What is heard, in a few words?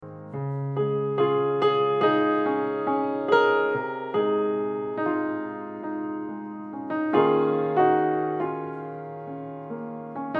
instrumental